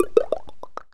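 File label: VOX PERCOLATOR; Northstar - New Gold VOL-2; RAP CITY 1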